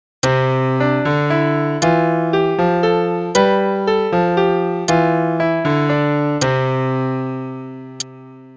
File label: polyrhythm for RH LH; qn=40; CM Level 6 Tech Req